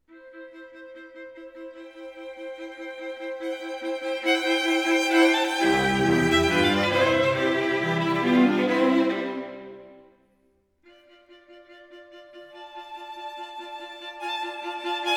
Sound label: String Quartet in C Major Allegro